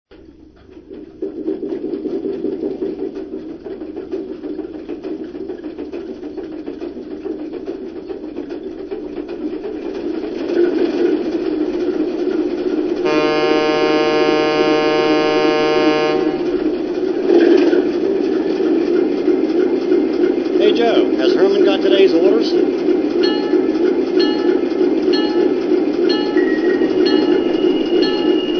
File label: Alco RS3, trains